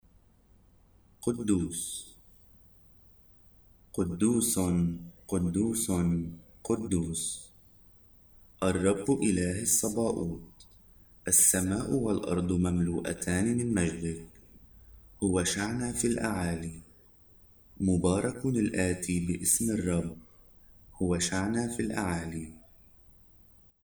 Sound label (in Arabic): قدوس